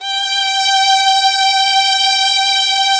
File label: TRON 3 VIOS G3; E-MU Formula 4000 Series Vol. 5; Protozoa; Vintage Presets